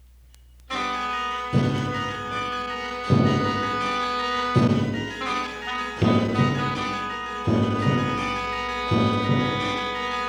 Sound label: Harpsichord; Tientoes for Harpsichord, English